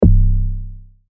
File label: log drums